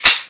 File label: rangedweapons reload; minetest